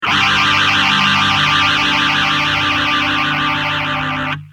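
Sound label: guitar Systech phaser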